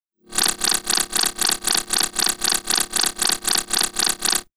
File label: Electric strobe